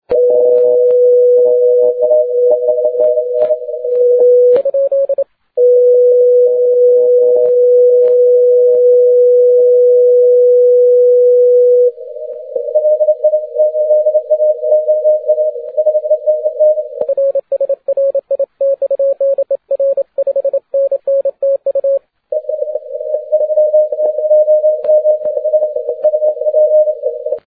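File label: 40m continuous wave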